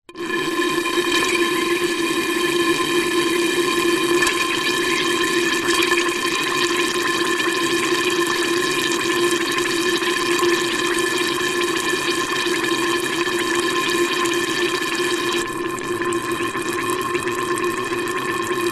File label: foley air blow through small pipe contaning little water bubbles gurgle long